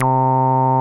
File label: MOOG LOW C2, ANALOG BASS; Music Store - Claudius Diemeter Schwerlos